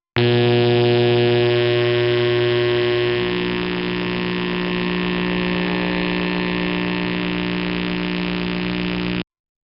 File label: low humming escalating to, electric